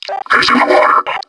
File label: water; hl2rp; proto cop